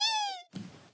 Shower end short

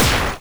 Explode; gamerip; every extend

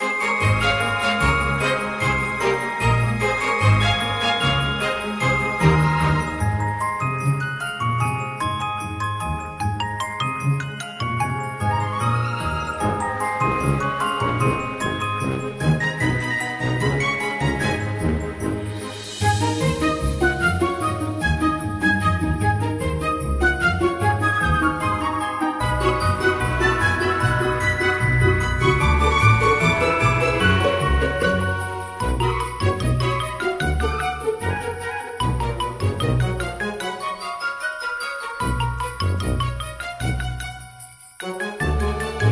Christmas Ui; Angry Birds Reloaded

